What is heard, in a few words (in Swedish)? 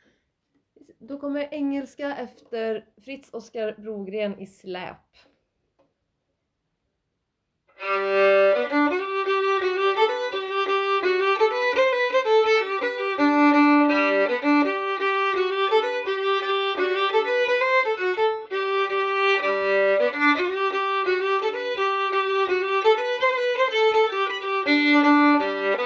Engelska från Släp